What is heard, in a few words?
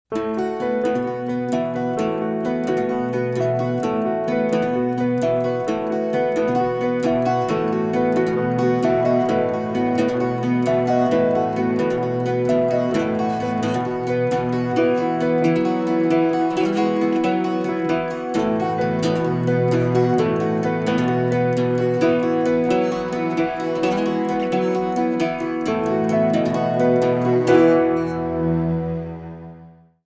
Ripple In The Water small mix, acoustic studio